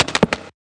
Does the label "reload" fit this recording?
Yes